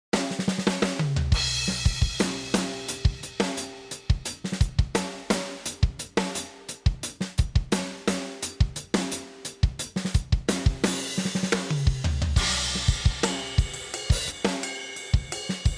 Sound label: drum sample